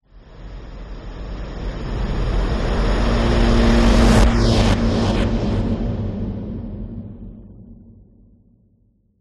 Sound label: whoosh; orlando lanzini